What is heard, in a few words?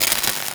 Worker Welder Start; Warframe; Grineer